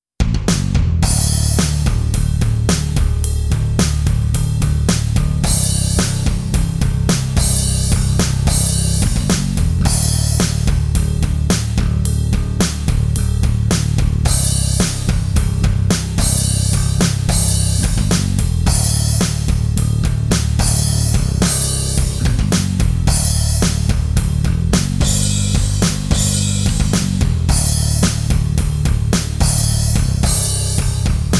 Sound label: bass guitar; music smorgasbord